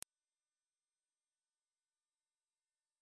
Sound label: 12000 Frequency Tone, Mosquito Ringtones